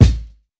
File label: Heavy kicks